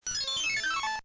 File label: stage start